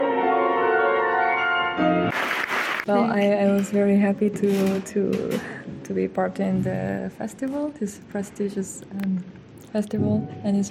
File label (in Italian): pianoforte; RADIOGRAMMA